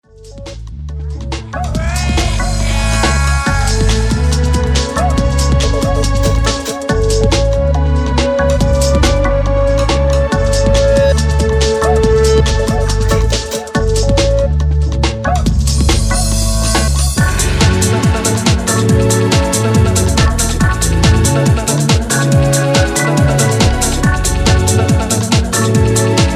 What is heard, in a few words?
HOUSE